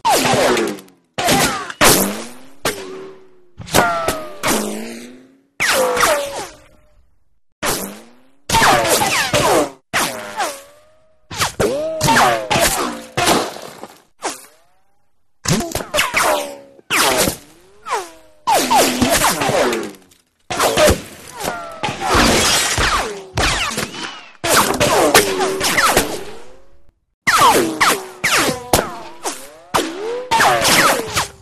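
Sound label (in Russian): рикошеты и пролетающие пули; loop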